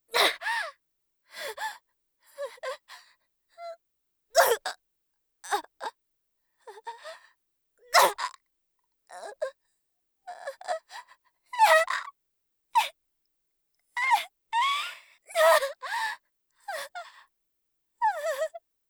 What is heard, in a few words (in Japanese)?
鈍痛; 効果音なし